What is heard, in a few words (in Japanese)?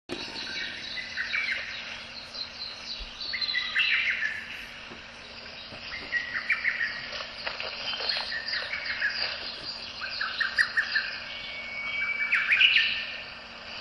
ホトトギス